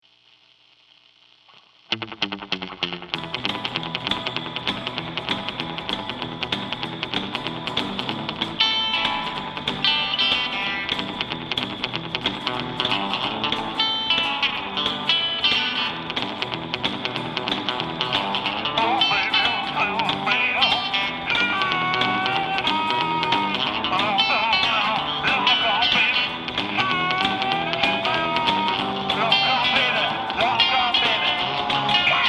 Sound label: calypso guitar cat